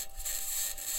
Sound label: mic, mars2020 edlcam